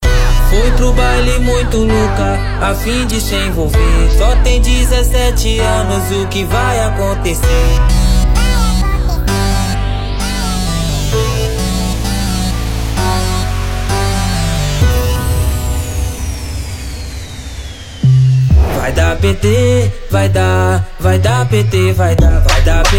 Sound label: Funk Production Remix